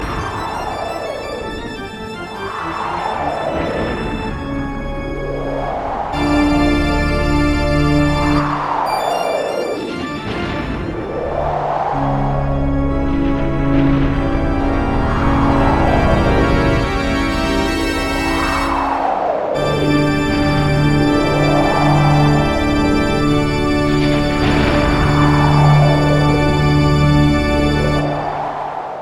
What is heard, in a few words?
Castle Music